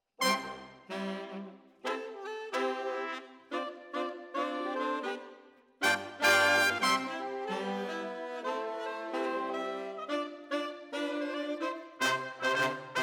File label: Brass Over